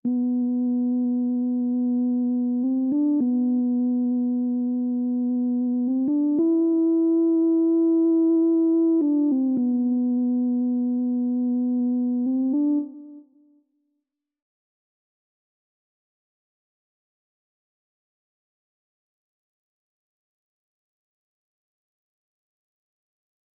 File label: 75 BPM A Min Lead; Nationals; Retro Collection